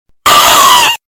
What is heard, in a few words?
scream; glitchworm games thicc boi